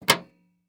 Sports Grill Metal Grill Cover Unlocking

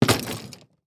Sports Bag Golf Bag Dropping On Cement

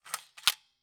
Foley Toy M16 Gun Cocking

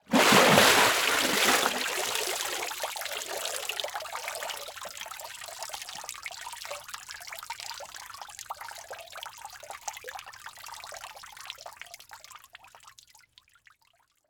Liquid Water Water Body Stand Up And Drip

